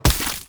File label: fire spear